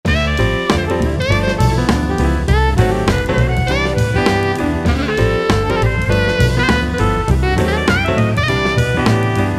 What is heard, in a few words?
Idim jazz